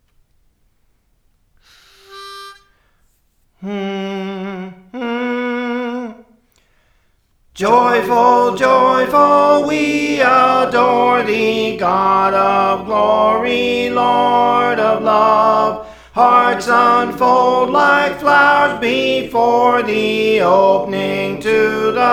Soprano; Praise For The Lord Training; Joyful Joyful We Adore Thee

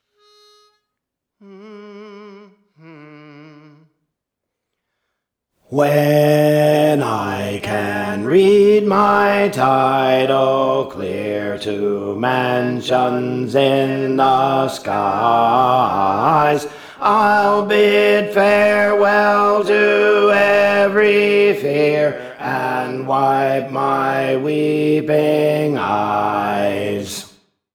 Bass; Praise For The Lord Training

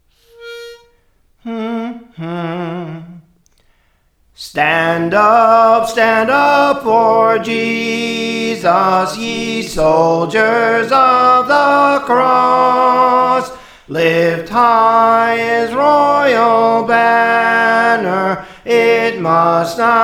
Stand Up Stand Up for Jesus, Soprano; Praise For The Lord Training

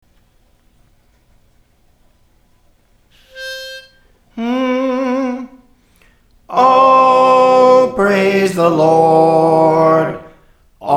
Soprano, Songs; Praise For The Lord Training

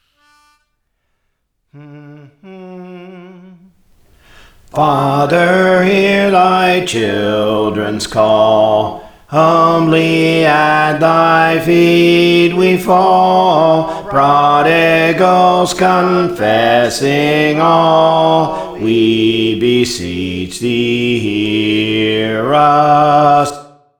Bass; Praise For The Lord Training

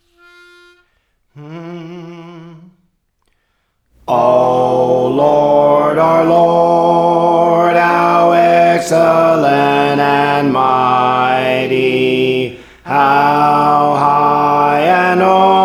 Alto, Songs; Praise For The Lord Training

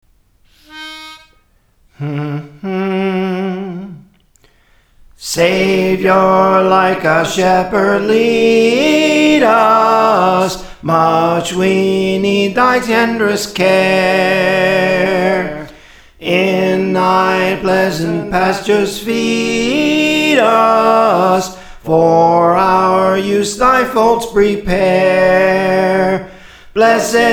Soprano; Praise For The Lord Training

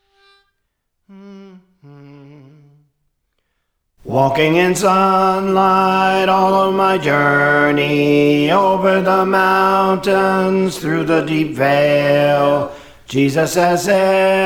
Bass; Walking in Sunlight; Praise For The Lord Training

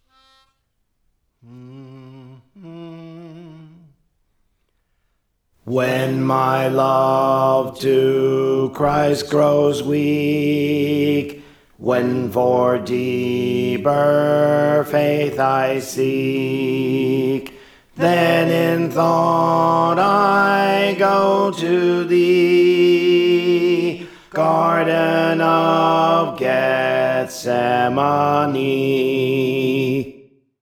Alto; Praise For The Lord Training

